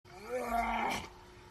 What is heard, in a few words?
dog noise